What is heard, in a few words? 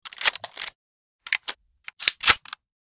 a91 load, weapon